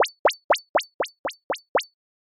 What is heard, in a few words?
footstep